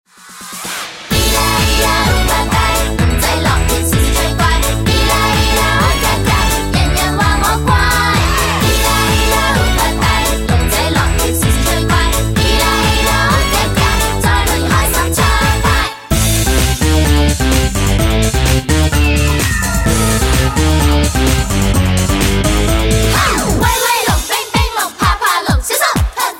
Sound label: (Karaoke)